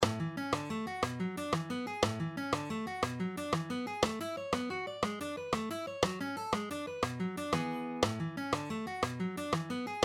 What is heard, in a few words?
String Skipping Exercises, Root, 5th and 10th Skipping; Frank Gamble’s Chop Builders